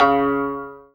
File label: ZIMBAL C#2